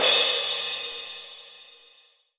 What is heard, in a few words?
Roland SC-88 Crash Cymbal